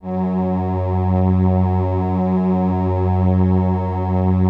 LAMBDA, String Machines

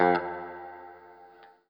Guitar & Bass; Quantum Leap; 90's Sample CDs

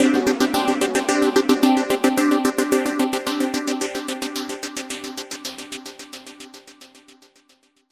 NRG Arp, trance

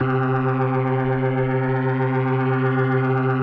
C3F, B3

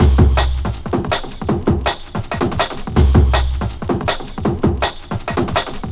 162, breaks; M8

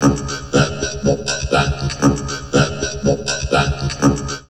VOCOD, Drum Loops Crazy Processed

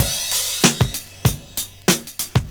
CYMB, Breakbeats

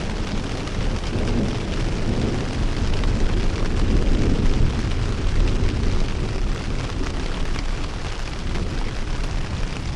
Weather, On The Glacier

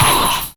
MONEYBAG, PERCUSSIVE

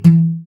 GUI AC, Acoustic Slap, Roland; Sound & Vision; Gigapack I CD 2

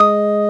organ G#, M1 organ; Best Service ProSamples vol.10; House; AKAI

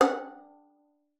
FING F#5, VIOLIN; Xsample VOL-5

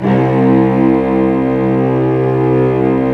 STR VCS, f Amb

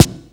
SN, S.DRUM, House Side Of 2 Step; USB Soundscan vol.51; AKAI

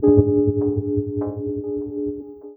CHORD, Drum & Bass, 150 BPM; Ueberschall; Drum & Bass Resonance

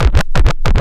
Synth Scratch; Mo' Phatt; Digital Sound Factory Mo' Phatt

